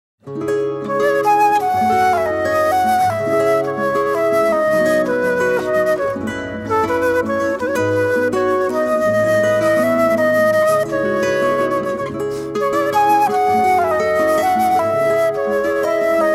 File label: Gitar Flut, jingle; Diyanet Radyo